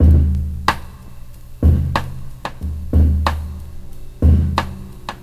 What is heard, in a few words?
funky skull, Drum loops Boombap